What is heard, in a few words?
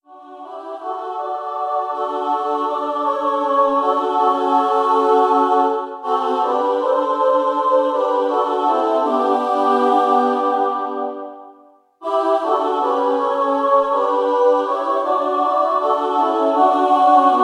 youth choral